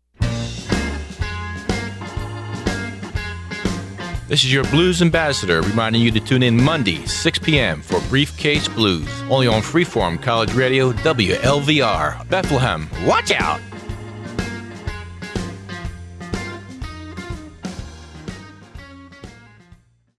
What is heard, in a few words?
BLUES, Electric Blues